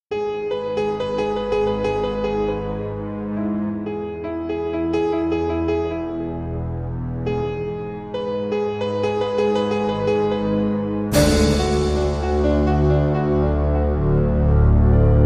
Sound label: fear piano film theme